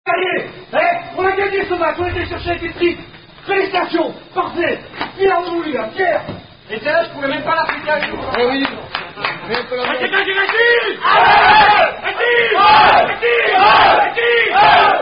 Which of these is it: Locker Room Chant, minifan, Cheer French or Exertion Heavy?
Locker Room Chant